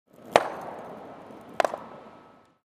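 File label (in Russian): кёрлинг камень ударяется о другой камень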